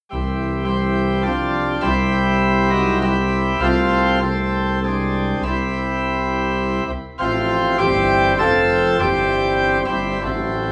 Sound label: Organ Low in C